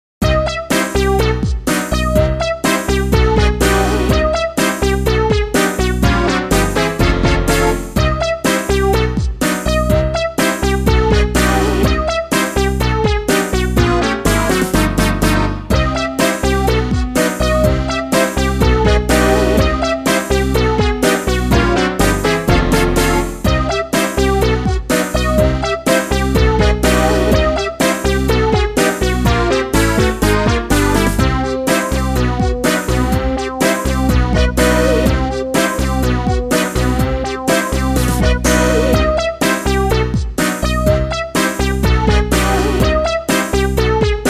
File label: Music Success event